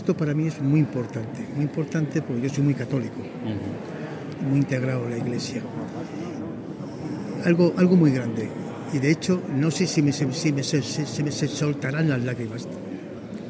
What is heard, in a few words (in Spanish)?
Procesion; de la misericordia en la semana santa de toledo